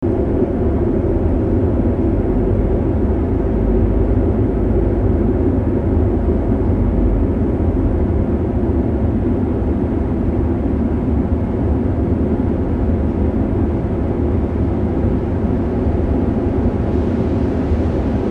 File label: HUMREC, Brummton in und um HEIDELBERG am Neckar